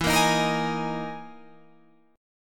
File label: E Major 7th Flat 5th